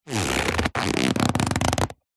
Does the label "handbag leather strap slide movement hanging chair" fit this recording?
Yes